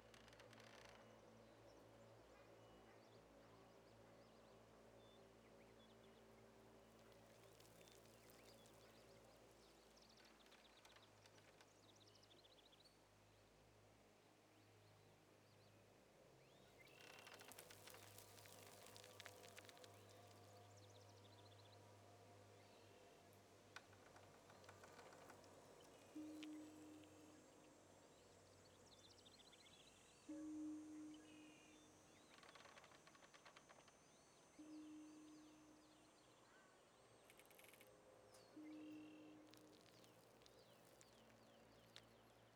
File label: bg chlorine; ui